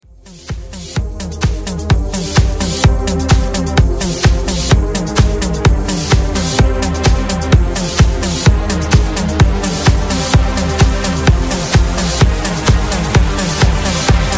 mashup 128 bpm

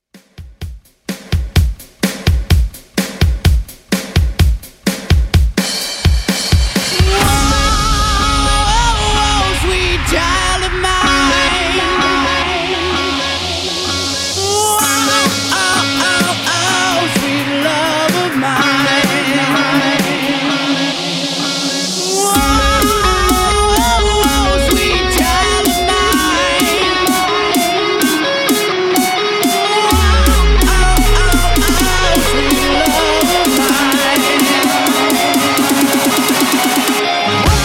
Epic Intro

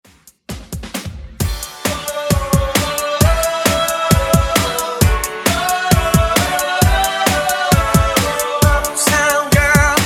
90s Boy Band Re Drum Uptempo 133 BPM

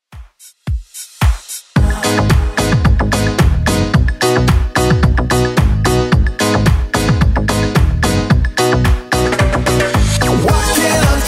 90s, 110bpm; Redrum

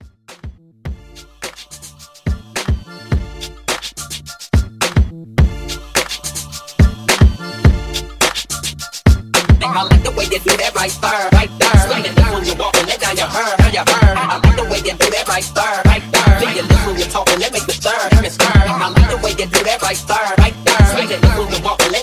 Uptempo Re Drum 106

